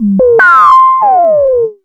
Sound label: SCI-FI; E-Lab; X Static Goldmine 1